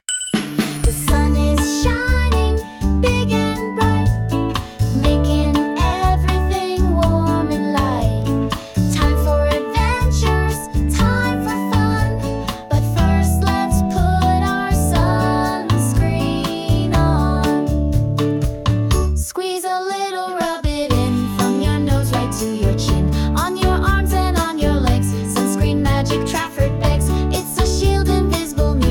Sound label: Suncream Song